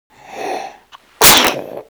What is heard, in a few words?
Hmn Sneez Bby